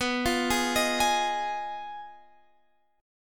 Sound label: B 6th Flat 5th B, Guitar, Standard; B6b5; x,14,15,13,16,16; arpeggiated; capo=2